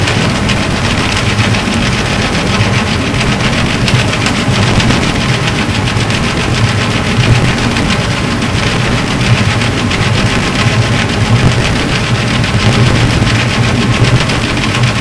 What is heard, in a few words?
Hydrophones, orcasound